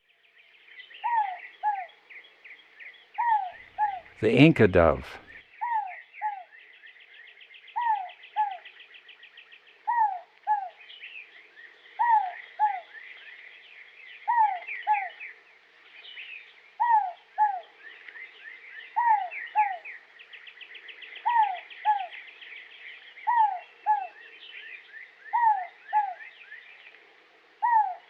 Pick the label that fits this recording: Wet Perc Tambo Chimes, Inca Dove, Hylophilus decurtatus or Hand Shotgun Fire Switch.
Inca Dove